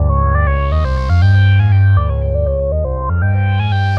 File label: synth arp; Electrocution